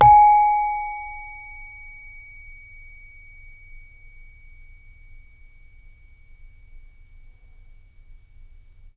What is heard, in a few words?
SARON G#3; Spectrasonics; Heart of Asia